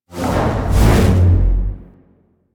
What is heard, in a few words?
Arm Lift; The New War; Lua War Room Part Two